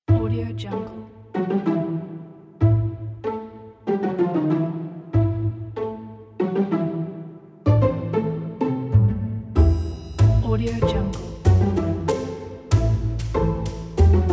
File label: funny